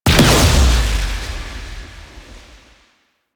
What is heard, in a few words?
Void Sink Explosion; Warframe; Void Storm; Railjack